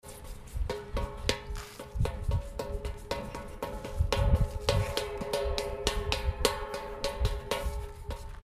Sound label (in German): Laufen auf Blechtrommel